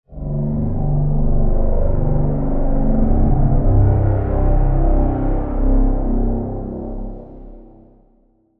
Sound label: Pad; Routed Sound; Spire Expansion - Chaos Bringer Vol.1